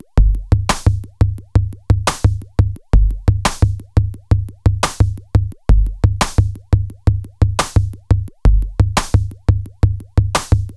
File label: Clean Ring Drums Loop, 87bpm